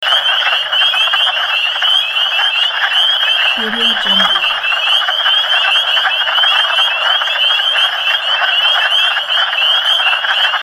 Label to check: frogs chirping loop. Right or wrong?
Right